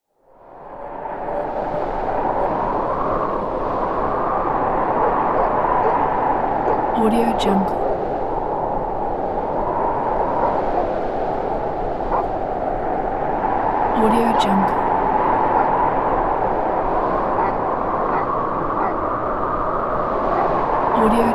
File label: winter snow storm with winds dogs barking